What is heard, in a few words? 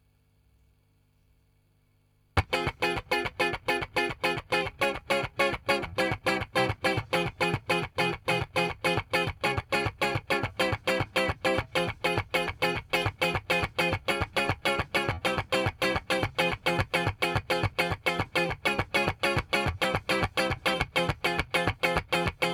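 Guitar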